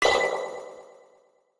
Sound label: Bonus; SNKRX